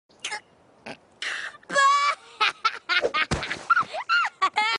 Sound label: laughing; rainbow dash